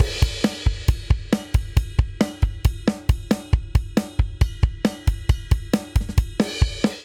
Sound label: Motorik Chorus 136 bpm; 4 4; MIDI Clips; Ableton; Factory Packs; Drum Booth